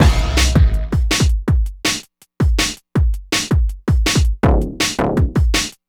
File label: Tek, Drums & Percussion, Electronica; Sonic Foundry (Sony Creative Software) - Electronic Point Blank